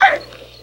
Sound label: FX, Futurist Drum & Bass